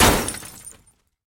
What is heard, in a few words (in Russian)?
korotkij gromkij legkij zvon bitogo stekla